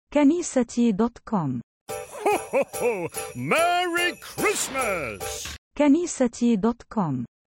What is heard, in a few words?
christmas sms tone; christmas-ringtones; نغمات الكريسمس